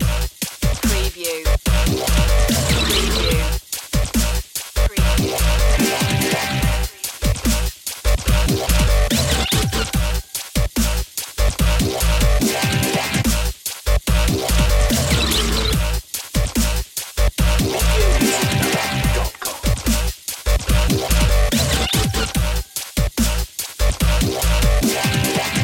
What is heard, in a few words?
machinery loop